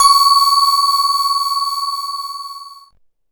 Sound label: C#, BELL GONG, Synclav FM; Big Fish Audio